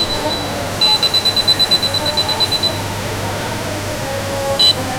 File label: supermarket ambient noise, shopping